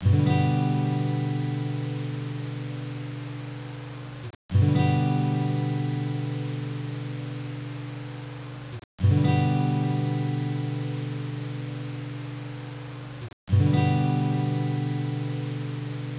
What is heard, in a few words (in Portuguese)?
guitarra Loop